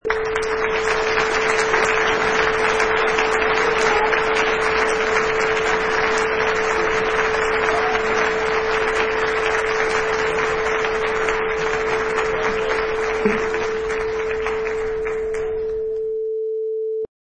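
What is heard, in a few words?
Large Crowd Applause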